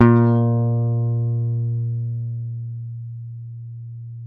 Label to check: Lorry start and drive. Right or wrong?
Wrong